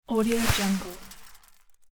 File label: transition swoosh spill particles